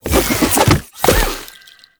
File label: Reroot Cast; Warframe; Nokko